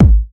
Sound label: fat kick drum g sharp key, kickdrum tuned to the G# note. Loudest frequency: 142Hz